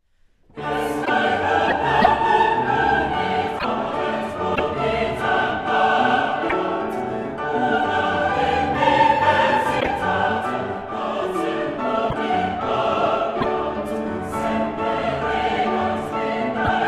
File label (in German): chor